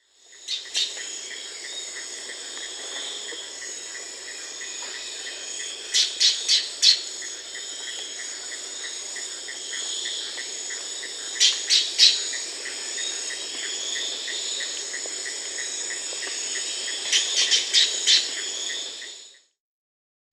Wedge-billed Woodcreeper (Glyphorynchus spirurus), Peru; S. America